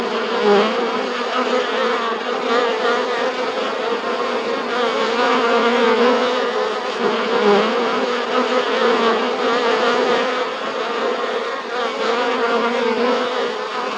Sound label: Bees, Texture Generation; Wei-Hsiang Liao